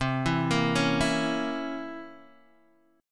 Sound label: C 7th Flat 9th C Guitar Open D